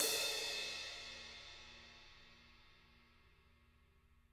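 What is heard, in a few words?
16 crash mf, jazz crashes, Living Drums; Sampleheads; Peter Erskine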